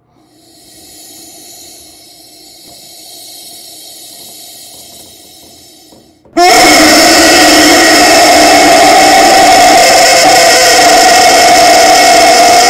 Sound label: Really loud rubber chicken.; AVERAGE ALARM CLOCK IN OHIO